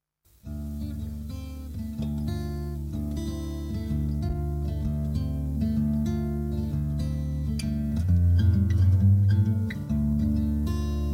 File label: C Harp